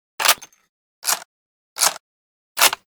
lee Enfield Reload; Modern Weapons Pack

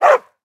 bark, mob wolf

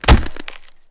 fall big, damage; HunterZ G4; minecraft

